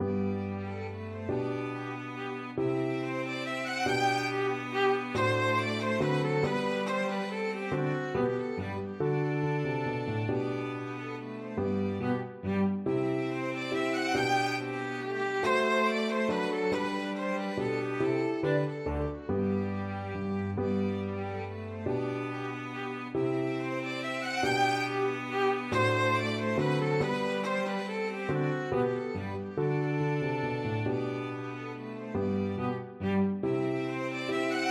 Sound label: minuet piano trio; bach